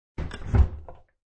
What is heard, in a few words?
Armoire Dr Sht, Shutting amoire door.; Door Closing; Doors